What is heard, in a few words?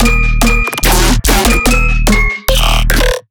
bass loop bells 145 D#; memphisbells; Virtual Riot